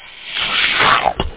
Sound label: SLURP; da capo vol1